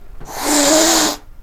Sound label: hiss